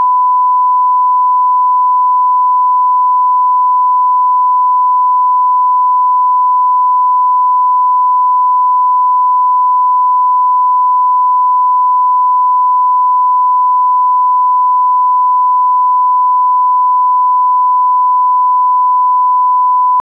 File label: Sine 1000Hz 7.1; 192kHz; 24bit